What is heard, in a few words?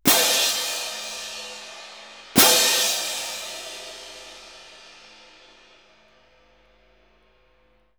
105 cymbals, Orchestral arrangements, 105 F min